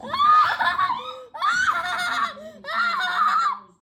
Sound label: Laugh, Bobby is home sick again today